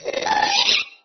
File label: charge, ghast